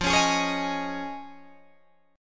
F Minor 7th Sharp 5th, Guitar, DADGAD

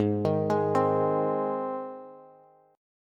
Ab Major, Bass; arpeggiated; JGuitar

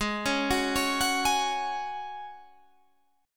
Db Suspended 4th Ab

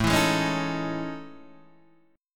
A Major Sharp 11th, Guitar DADGAD

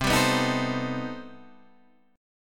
C Minor Major 13th C down Guitar Standard; CmM13 strummed; JGuitar

